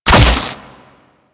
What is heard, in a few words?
Impact C; Condemn; Warframe; Priest